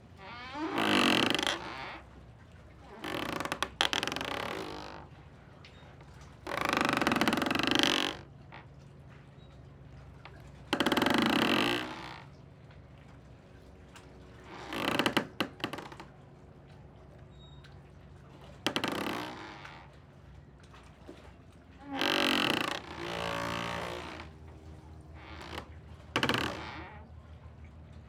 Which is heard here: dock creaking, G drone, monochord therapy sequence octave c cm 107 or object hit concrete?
dock creaking